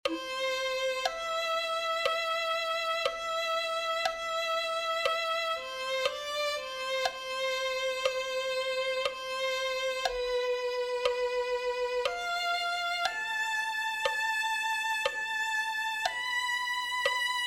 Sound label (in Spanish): violin